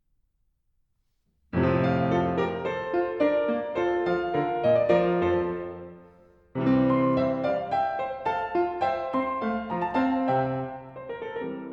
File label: Italian Concerto in F major BWV 971 I.